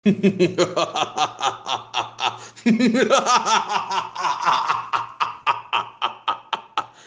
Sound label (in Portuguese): muahaha lobisomen